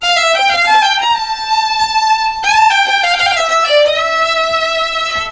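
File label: Balkanish Traditions strings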